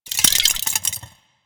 Sentient Metallic Move; Enemies; Sentient Movement

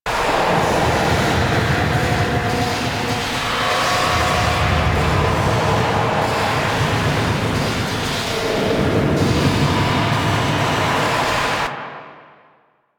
Void Dragon Floor Lava Pillar Loop; Enemies; Warframe; Duviri

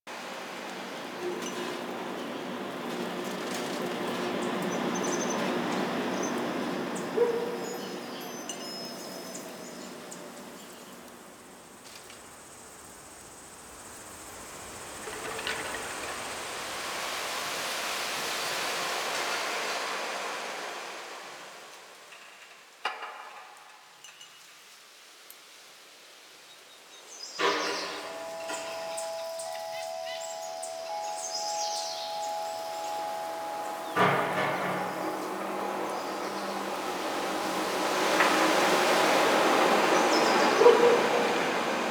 Summer Exterior Small Bed Loop; Warframe; Nineteen Ninety Nine; Ambience

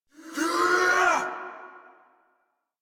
Attack Effort; Warframe; Dax Ashigaru; en

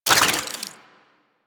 Idle Spin, Weapons; Warframe; Parazon

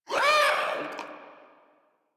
Infested Runner Hear Sound; Warframe; Runners

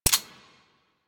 Sniper Rifle Mech; Weapons; Female Sniper Rifle; Grineer

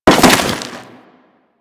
Crewman Land Dirt, Footsteps; Warframe; Corpus

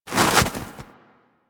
Armadillo Move Snow; Warframe; Hunting Critters; Foley

